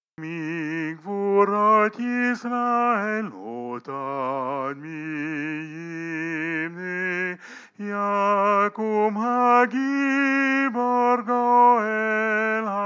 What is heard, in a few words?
chant, soprano chante; homme chanté